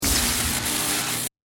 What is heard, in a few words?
Skin Lightning; One Shot Fishing